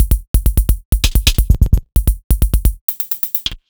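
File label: Odd Glitch, 130 BPM, Percussion; Sonic Foundry (Sony Creative Software); Underground UK House & Electro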